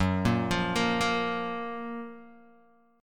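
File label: G flat Major 7th G flat Guitar DADGAD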